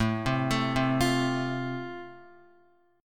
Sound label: A Minor Sharp 5th, Guitar; x,0,0,2,0,1; 4F,4A,5C,5G,5C,6E; arpeggiated; JGuitar